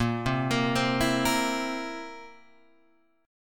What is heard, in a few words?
A sharp Suspended 2nd Flat 5th A sharp down Guitar Open G